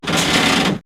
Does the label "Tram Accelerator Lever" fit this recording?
Yes